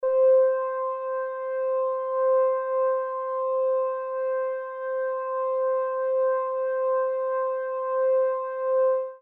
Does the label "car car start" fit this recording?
No